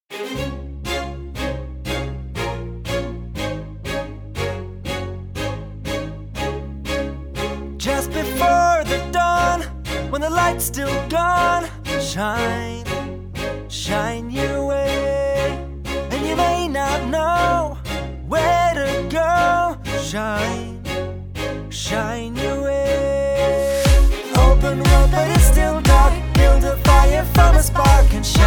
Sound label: backing track